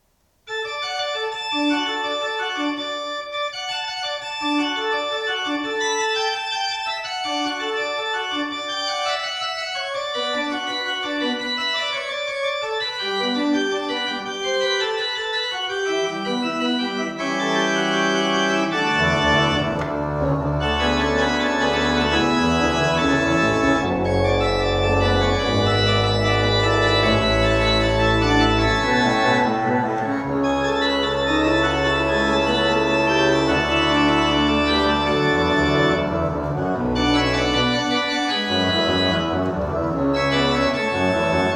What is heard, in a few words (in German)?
buxtehude praeludium d dur